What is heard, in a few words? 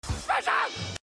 SPACESHIP